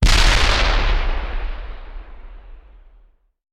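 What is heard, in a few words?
Artillery Explosion; Sound Ideas; Guns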